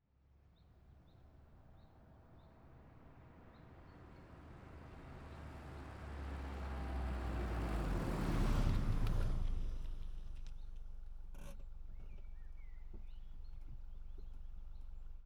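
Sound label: vw polo exterior fast up stop idle; VW Polo 2003; ORTF; MKH8040; Pole Position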